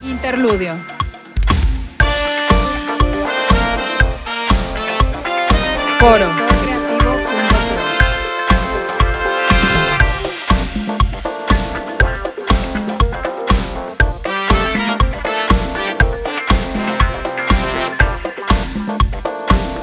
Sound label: 120bpm 4 4 Bb